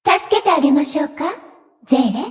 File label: section fail; Seele 【selyu】